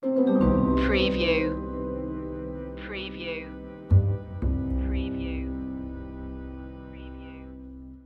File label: silent noir stinger